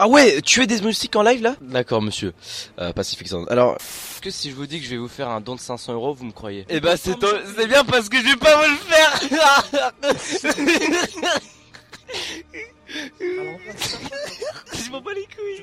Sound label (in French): fou rire; pacific sound 3003